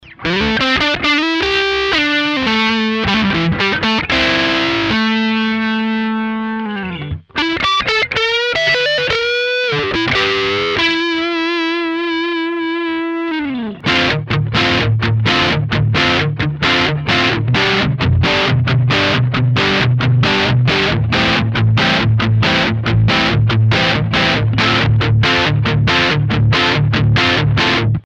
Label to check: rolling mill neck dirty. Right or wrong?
Right